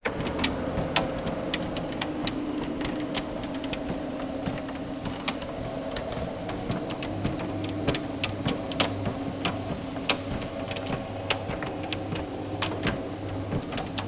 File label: household door grille security electric close